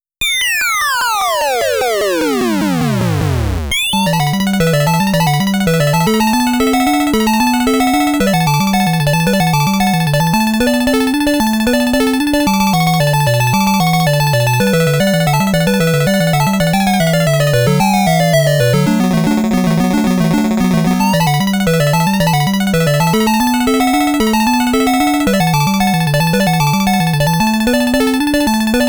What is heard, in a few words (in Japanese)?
ワープ ～ 地下 BGM